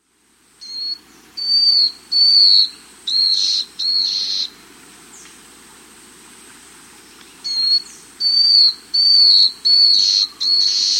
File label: Scaled Antbird; S. America